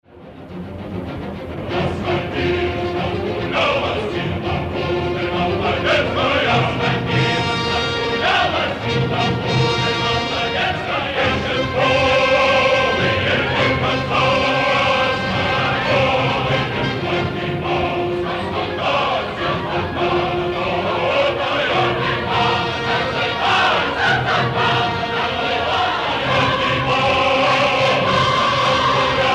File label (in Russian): сцена под Кромами хор; Мусорский